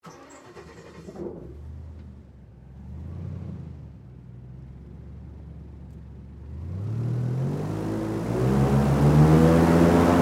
Airboat Start Run, Transportation, Watercraft, Airboat, Start, Run, Off, On, Board, Perspective